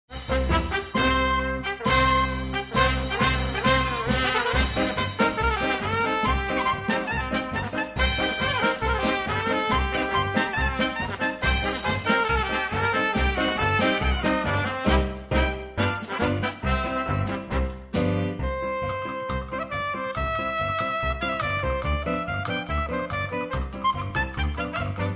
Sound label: Klezmer Medley; Living Stereo